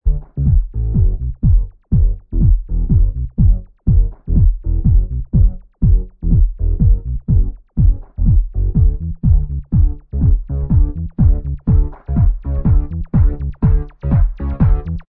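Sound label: instrumental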